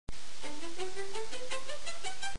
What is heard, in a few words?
staccato; musica classica